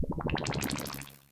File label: blubbles, zaps and beeps